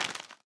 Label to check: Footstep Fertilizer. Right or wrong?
Right